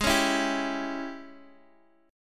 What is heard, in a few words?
Ab Major 7th Suspended 4th Sharp 5th Ab Guitar Drop D; x,11,11,9,8,x; down